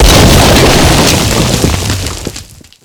collapsing burst; Modern Warfare

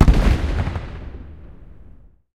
aa explosion far

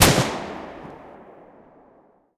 mg 16mm kpvt lastshot; guns; tanks; War Thunder

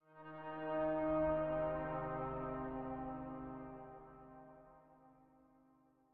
music interactive; World of Tanks

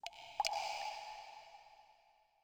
water drip; Rust

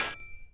Drop Axe; Civ4 warlords

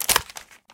kac pdw clip out; Escape from Tarkov

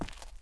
Foot Tundra; Civ4 warlords

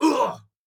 flinch med; Rust

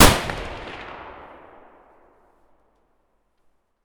fire close; Escape from Tarkov

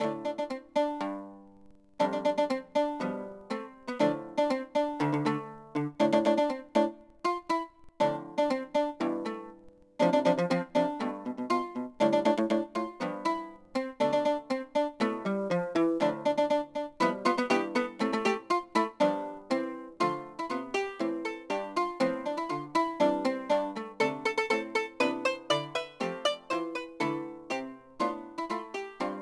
City Ancient Small Music Sad; Ambient; Civ4 warlords

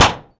roadsign impact

